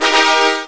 fanfare; Game Maker 8

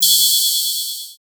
Ride Reso Synth; Drums

